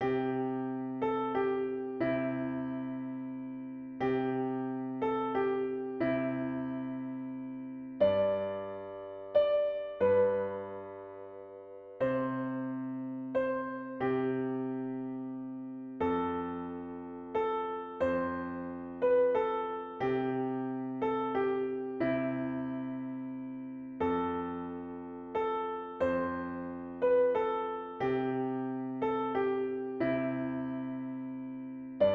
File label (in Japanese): きよしこの夜（ピアノ）